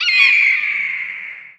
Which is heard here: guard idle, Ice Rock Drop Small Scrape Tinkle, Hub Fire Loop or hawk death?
hawk death